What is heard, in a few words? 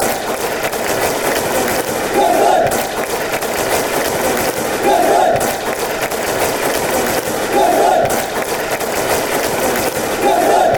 clap, fan chants; grimsby